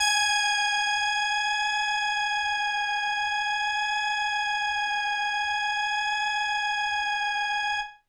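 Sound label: Indie Organ Kawaii G#1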